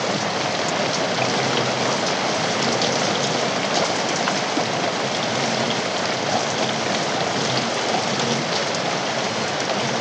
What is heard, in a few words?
Pink Noise Nature Sounds White Noise Rainy Boats